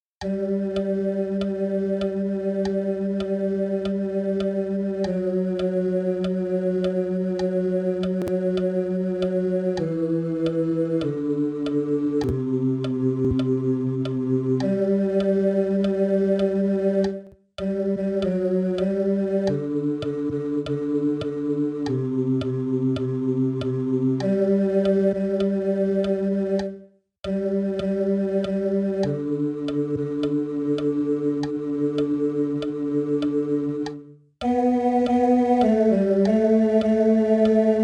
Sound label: BAS; Salve Regina